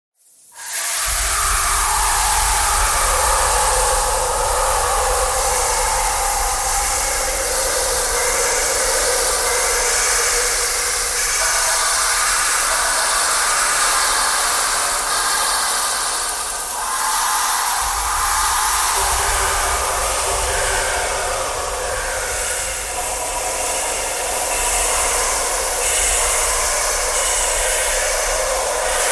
breathing noise

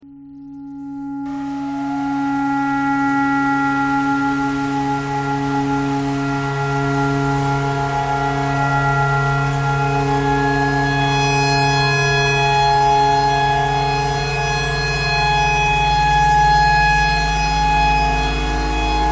tornado siren clarinet thread pentatonic

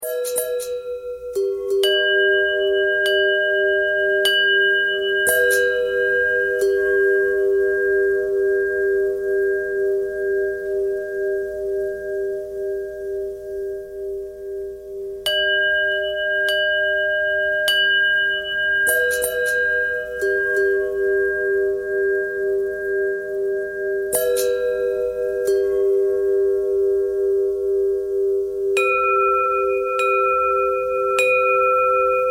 bell longbell thread bendthread; bells

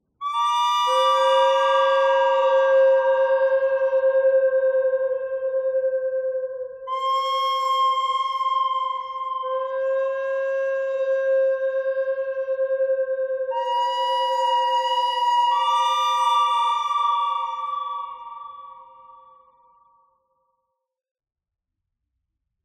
bird thread buzz; noreverb

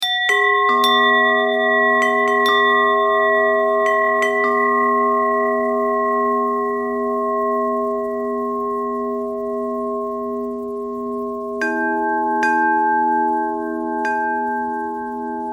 bell thread

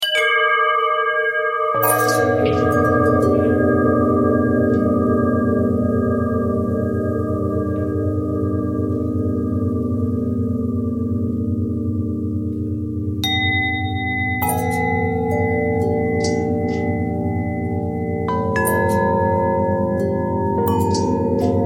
long bell bell twist

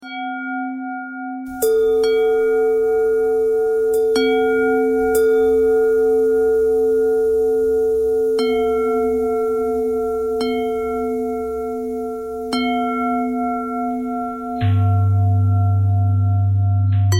bell thread bend thread

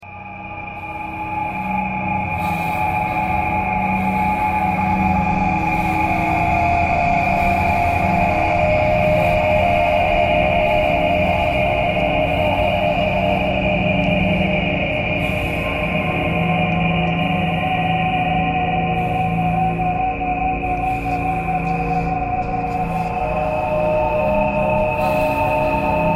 thread noise

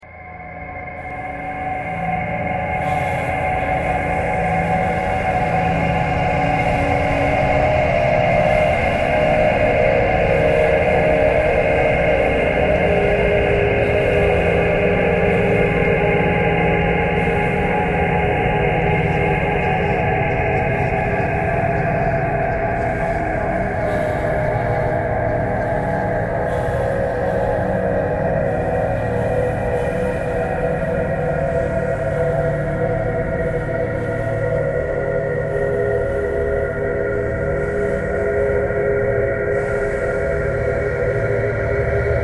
traffic core birds thread harmonic

